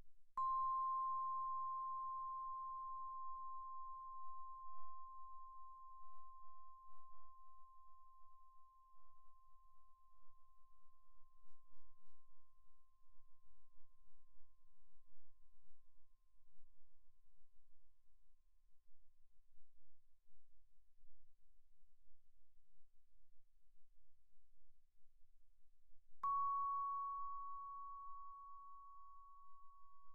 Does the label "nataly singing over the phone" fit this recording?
No